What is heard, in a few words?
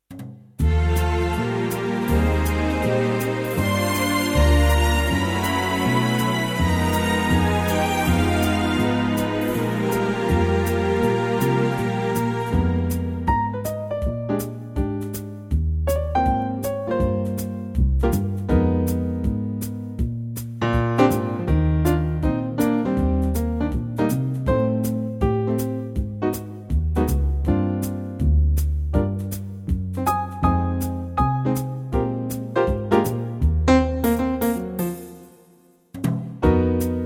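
Eb, backing track; key - Eb - vocal range - Bb to Eb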